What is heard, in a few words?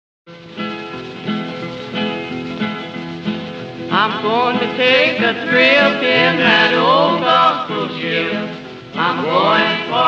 homophonic